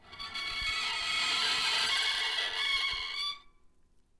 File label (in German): Bratsche als quietschende tür